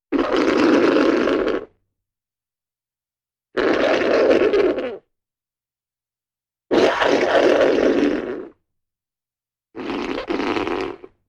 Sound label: Nose Blow with heavy phlegm